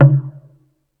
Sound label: Tool Kit, Drum Machines E-MU Pro-Cussion